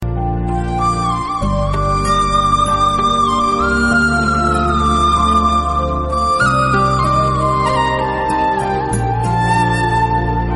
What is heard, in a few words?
Flute Ringtone; Hare Krishna